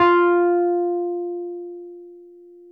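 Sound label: Wurlitzer electric piano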